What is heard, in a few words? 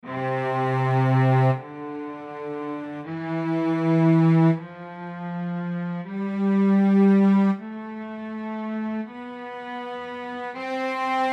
five notes in do re mi; scale